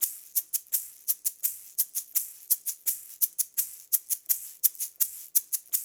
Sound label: LOOP, SHAKERS; Percussion; InVision Interactive; Percussion Slam